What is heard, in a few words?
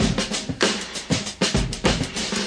thick with rolls, drum loops and breaks, 97 bpm